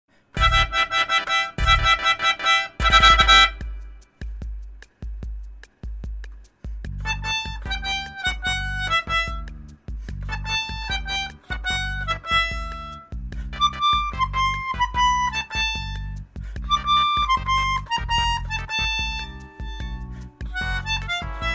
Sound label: Melodica; WiiShop